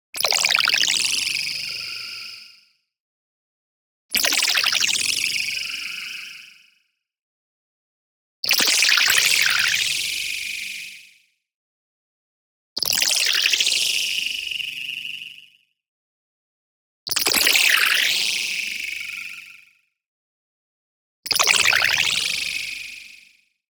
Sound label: STINGER Tail Snap; boom library; Anime Essentials